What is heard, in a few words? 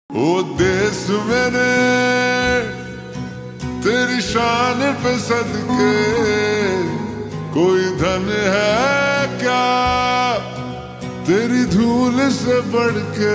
Ringtone slowed and reverb